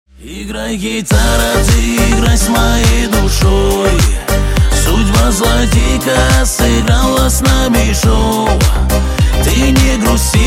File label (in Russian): igraj gitara